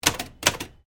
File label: Typewriter Remington Standard 10 Double, Old typewriters; 1900S; Typing; Offices